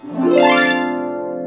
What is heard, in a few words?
HARP; AKAI S-Series CD-ROM Sound Library VOL-2; CHO HARP